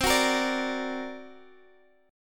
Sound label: D Augmented 7th C, guitar